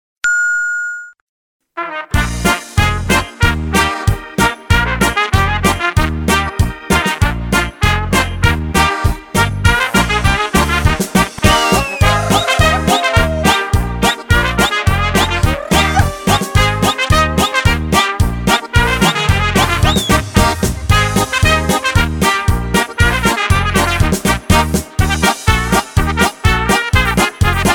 polka

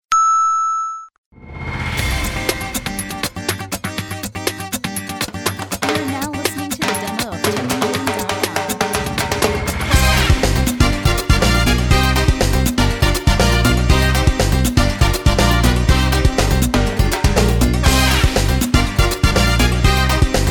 Salsa

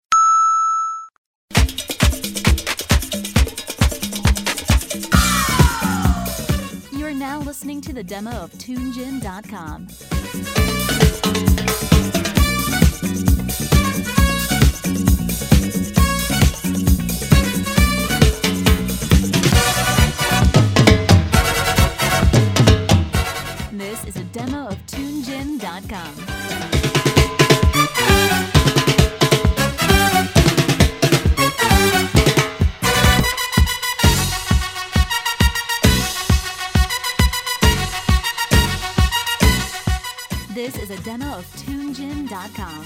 Cha Cha; Tunegym

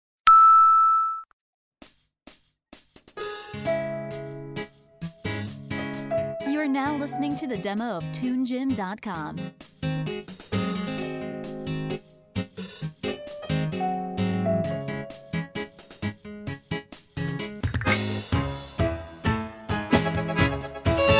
Accordion

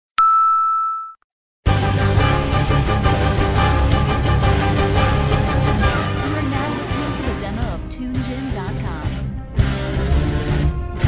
C minor

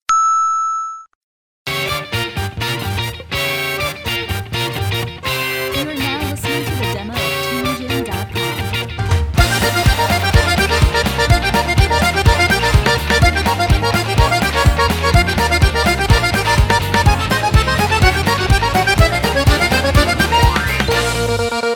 Rock and roll

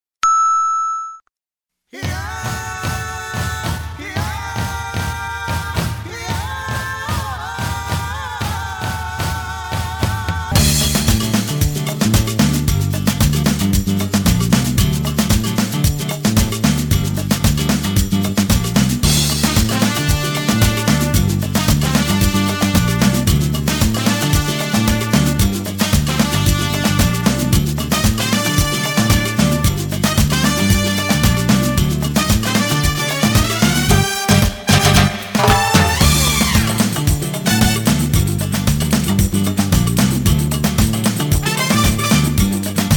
Samba

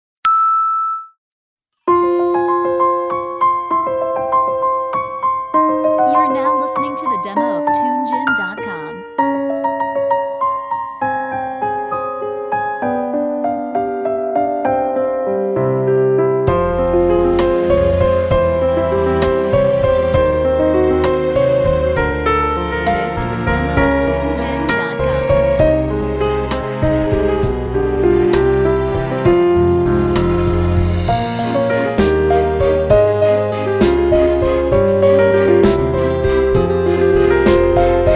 Sad Walking

